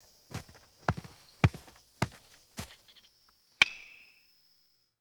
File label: cricket ball striking a wooden bat; sports